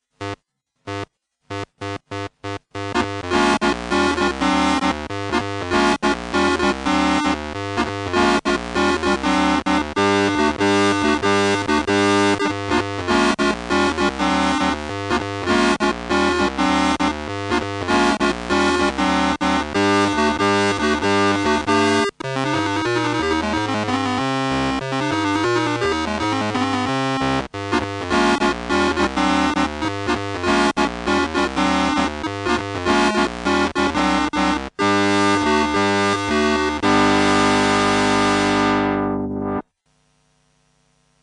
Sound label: swing